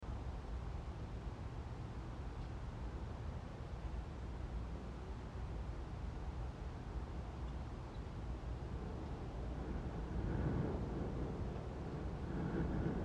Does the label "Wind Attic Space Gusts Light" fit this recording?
Yes